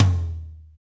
acoustic tom drum sound e key, Royality free tom single hit tuned to the E note; tom drum samples